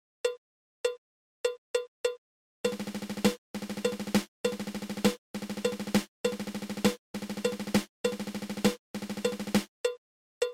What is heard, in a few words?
Nine Stroke Roll